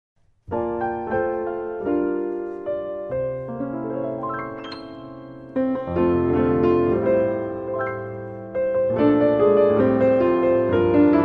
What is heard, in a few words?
piano solo